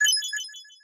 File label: mercy add; deltarune yellow translation